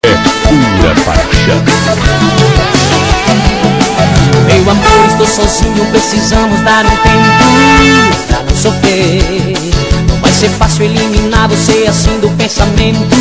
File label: metal